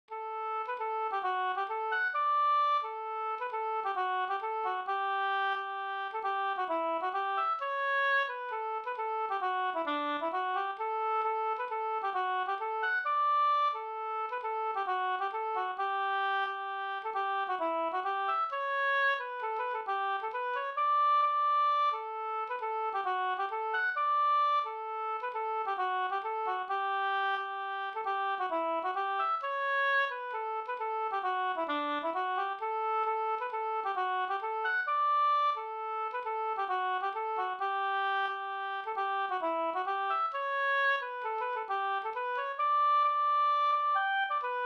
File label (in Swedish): hambopolska